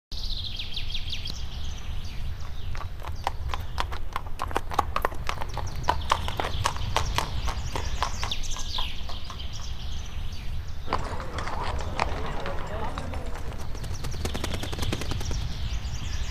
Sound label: env City Peace; Joan of Arc